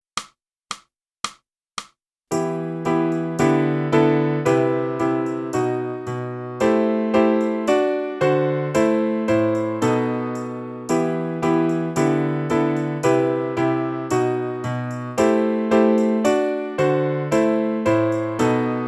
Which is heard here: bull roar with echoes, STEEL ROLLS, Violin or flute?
flute